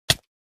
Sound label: hit sms ringtone whatsapp notification sound; minecraft